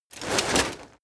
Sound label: plate armor movement rustle